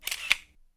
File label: camera shutter